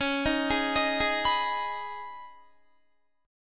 Db 7th Suspended 2nd Db, down, Guitar; Db7sus2; arpeggiated; tuning=4E,4A,5D,5G,5C,6F

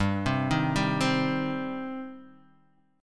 G Suspended 4th Sharp 5th, Gsus4#5 chord; arpeggiated; JGuitar

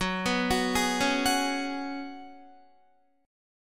Gb Suspended 2nd Suspended 4th, Guitar; arpeggiated

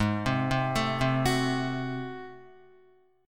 G sharp 7th Flat 9th G sharp down Guitar, chord; G#7b9; arpeggiated; JGuitar

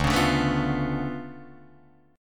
D Minor Major 13th, down, Guitar, DADGAD; DmM13; strummed

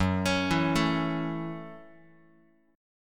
Fsharp Minor 7th Fsharp, Guitar; arpeggiated; x,2,4,2,1,x; 4A,4E,5A,5D,5G#,6C#

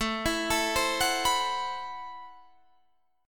A Minor 6th Add 9th, Guitar, Standard; Am6add9 arpeggiated; 17,19,19,17,19,19; JGuitar

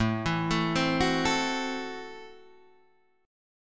C 6th Flat 5th A

